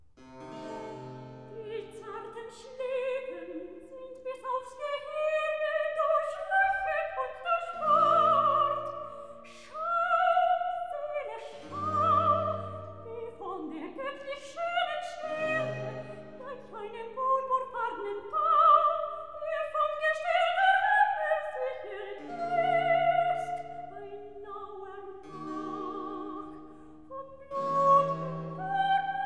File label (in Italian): Recitativo; Die zarten Scläfen; Brockes Passion